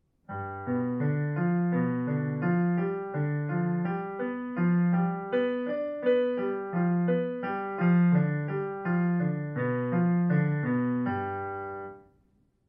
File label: Dominant seventh broken chords and inversions